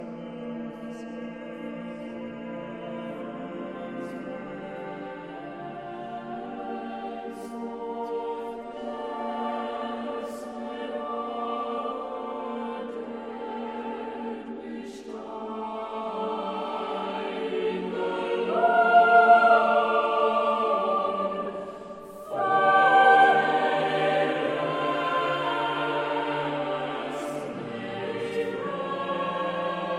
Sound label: requiem; howells' requiem; vi. i heard a voice from heaven; a prayer for deliverance